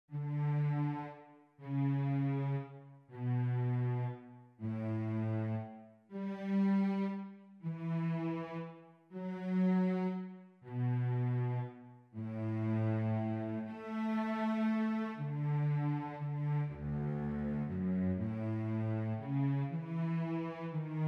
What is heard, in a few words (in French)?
VIOLONCELLE